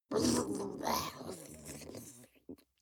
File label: witch babble slobber; kreaturen